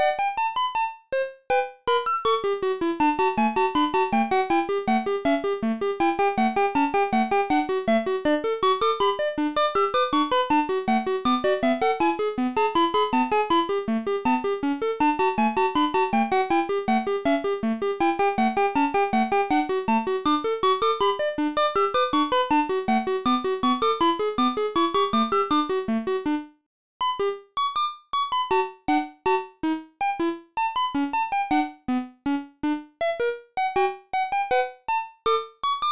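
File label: background music; My Frogger Toy Trials; Nintendo DS; PARK FROGGER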